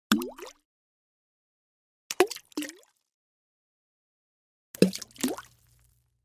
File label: Falling fruit in water